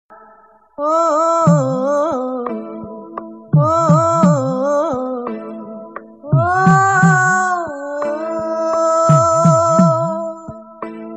Sad Love

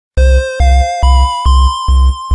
text tone; Yume nikki

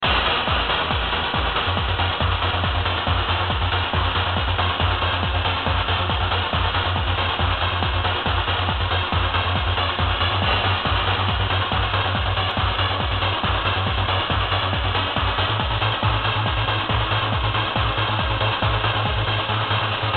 Adagio For String

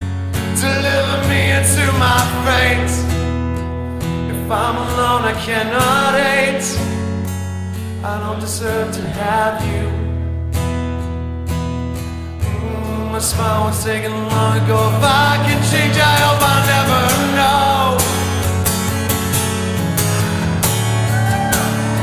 Acous

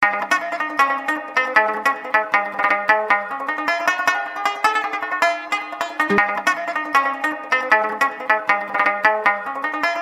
Banjo Speed

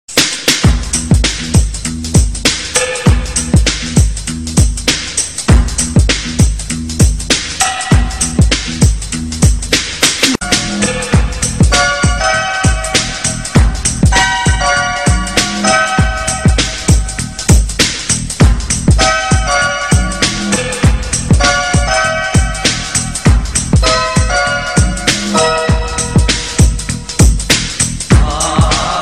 Dance Music

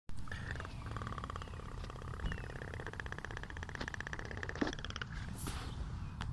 Wind

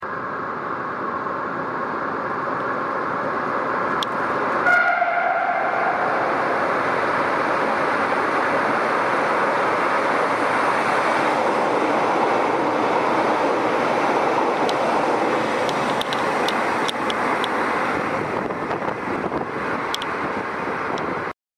horn; FGC CAF 112

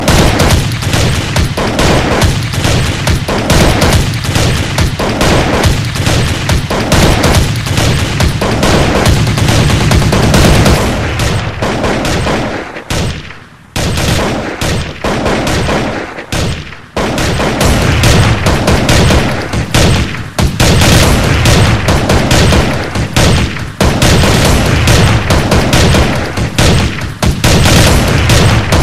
Gun Sounds

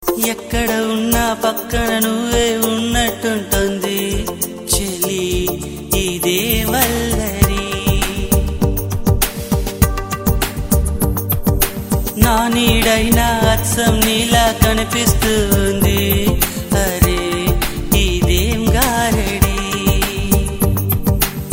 Telugu Melody

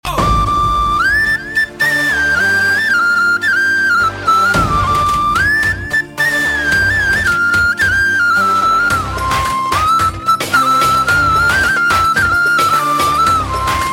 Aegan Flute

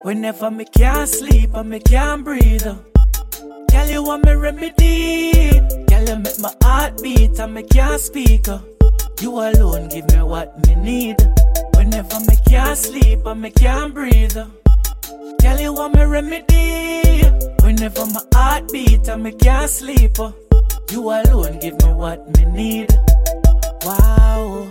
heartbeat

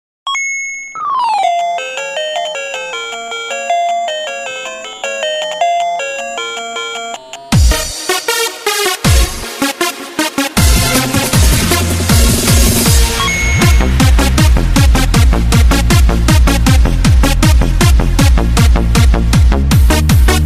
techno tetris

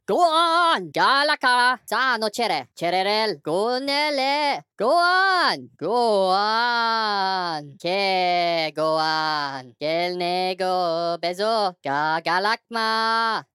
Chant; Moss prophet

